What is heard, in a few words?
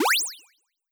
jump high